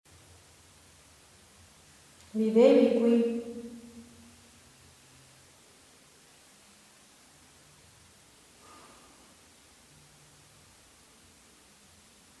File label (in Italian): sospiro